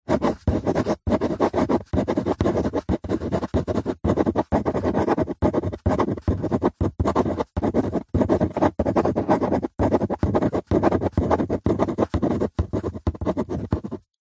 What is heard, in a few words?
office handwriting pen on paper on cardboard box fast; zapsplat